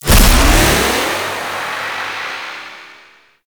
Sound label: Blink Slam Jump; Warframe; Infested Lich